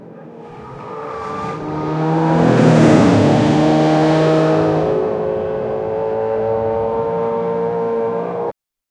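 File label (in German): auto fährt zu schnell in, crash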